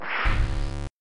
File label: monster bite blast wrong answer